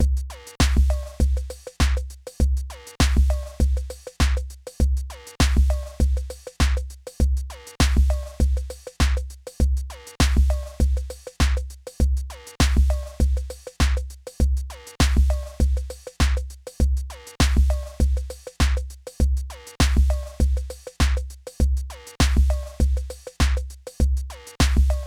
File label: Sample drumloop Boom